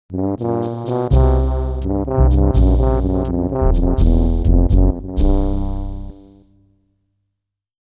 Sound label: SHOWDOWN LOSE; Super Donkey Kong 3